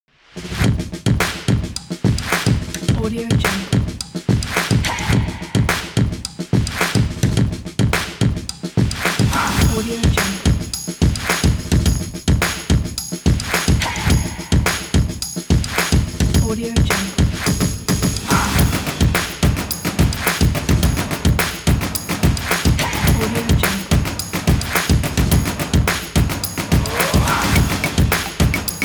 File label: percussion